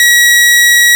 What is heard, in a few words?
Saw Bass Wave F5